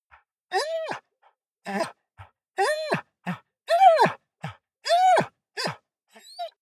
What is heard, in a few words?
dog whine pant; natur-tiere; hunde